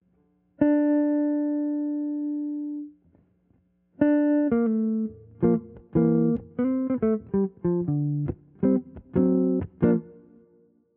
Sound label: Key Center D F∆